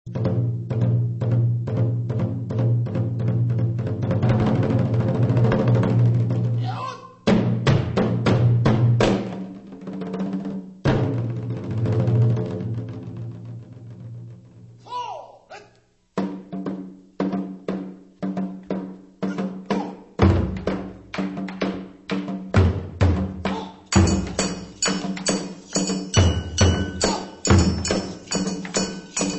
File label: percussion; hozonkai